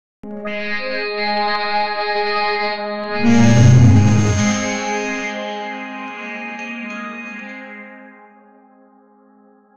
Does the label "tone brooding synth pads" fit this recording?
Yes